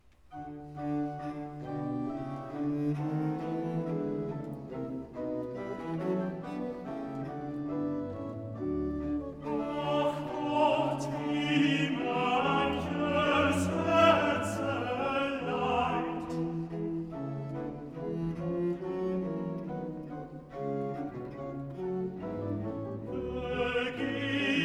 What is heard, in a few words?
Choral Tenor; Ach Gott wie manches Herzeleid; BACH VOCAL; CANTATAS; BWV 44; Sie werden euch in den Bann tun